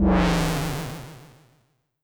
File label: weaken